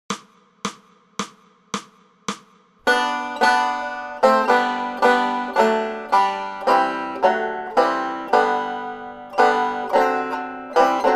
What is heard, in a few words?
Banjo; Away in a Manger; Banjo Tablature with Play-along Audio Tracks